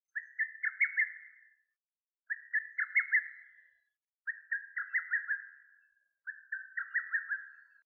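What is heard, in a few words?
lesser cuckoo call; hototogisu nakigoe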